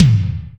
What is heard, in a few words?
SDX E TOM D4; Greytsounds VOL-1; SDX TOMS